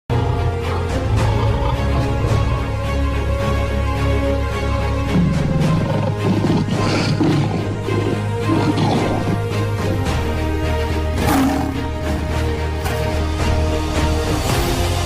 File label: lion bgm ringtone music lion roar uplifting music epic music; tamil